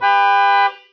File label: Car - horn; Muscle Car 3: Illegal Street